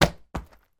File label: purse drop on carpet; foley